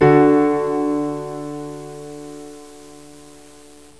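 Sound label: PIANO CHORD D; MUSIC; INSTRMNT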